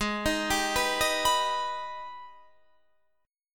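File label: Ab Minor Major 9th chord, Guitar, Half Step Down tuning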